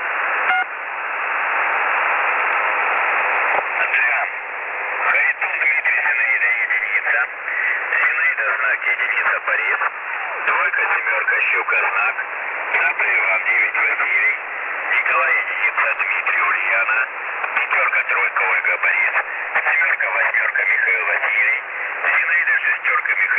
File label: usb msg